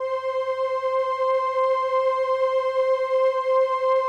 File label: LAMBDA, String Machines